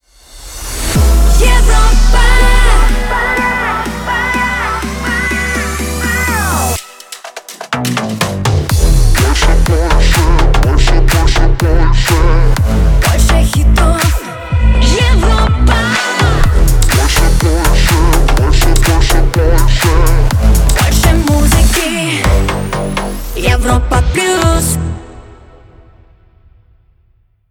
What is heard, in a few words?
Jingle; Europa Plus